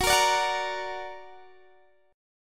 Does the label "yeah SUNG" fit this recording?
No